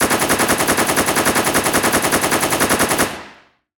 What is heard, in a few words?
machine gun fire; soundscrate